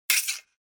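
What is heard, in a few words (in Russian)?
скользит клинок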